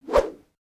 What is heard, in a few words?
Whoosh